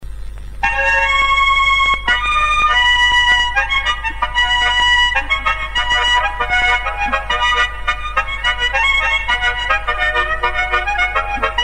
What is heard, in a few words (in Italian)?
armonica bocca orroli